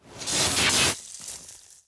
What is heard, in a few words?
Melee Impact Electric; Grounder; Evolve; Kraken